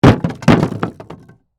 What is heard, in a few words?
Crash Wood Debris, Crash, Wood, Debris, Drop, On, Wood; Wood (Sound Effects:Materials); BlastWave